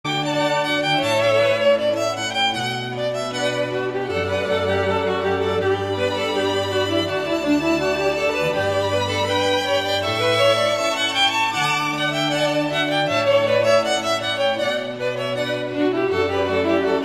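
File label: canon in D, Pachelbel, without lyrics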